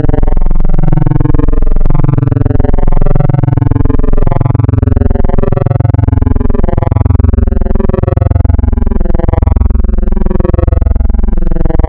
Spectral, wavetables; Architecture 32

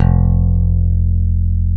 F-3 pick, pick bass; AKAI S-Series CD-ROM Sound Library VOL-7

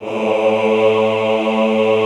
AHH A1, Classical Choir; Best Service; Extended Classical Choir

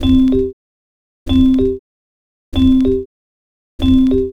Xylophone; Sonic Foundry (Sony Creative Software); Stylus Pressure Urban Grooves on Digital Wax; Pleasant Ride

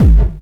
KICK hardcore, Techno Trance DRUMS

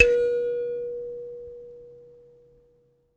Finger, Prepared Kit; Battery 3 Sample Kits

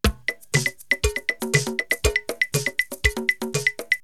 Drumloop; ZERO G - Datafile DISC 1

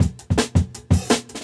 Cabinet; 90s Sample CDs; Original Jungle Breaks

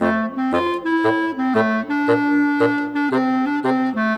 Winds, Orchestral, Rock Pop; Sonic Foundry (Sony Creative Software); Orchestral Series VOL-4 - Rock and Pop

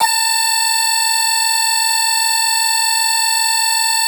synth clav

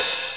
Roland R-8 Hat, Drums

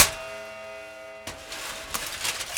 Prepared Drums

